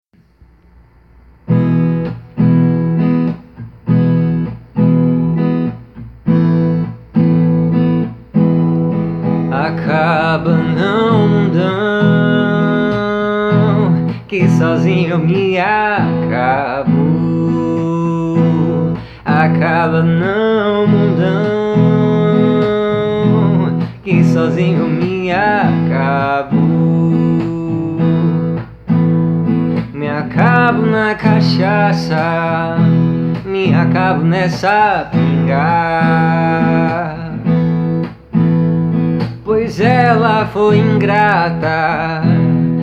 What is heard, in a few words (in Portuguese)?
voz e violao